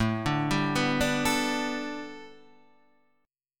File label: A 9th Suspended 4th Guitar Open D, chord